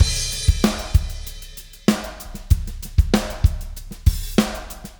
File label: Fast 16 096, Beat Loops; Zero-G; Monster Beats